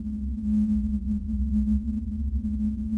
ambient

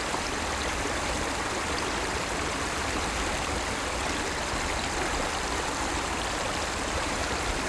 Light Stream Loop; Environment; BackgroundLoops

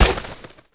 pigeon feather poof; Tony Hawk's Project 8; XBOX

